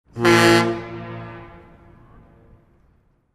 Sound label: ferry horn